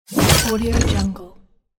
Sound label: magical ability